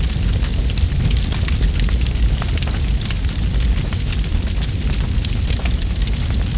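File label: large firewood loop; Star Wars Knights Of The Old Republic I; XBOX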